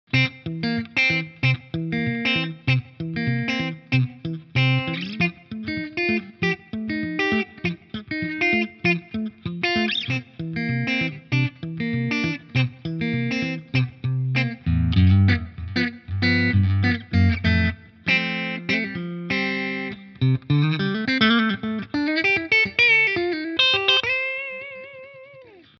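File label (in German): Reverend Trickshot Mitte clean, E-Gitarre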